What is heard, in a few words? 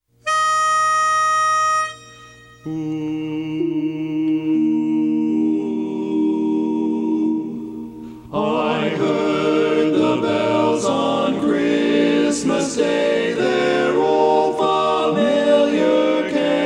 tenor; i heard the bells on christmas day